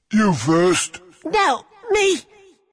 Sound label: death; dota; ogre magi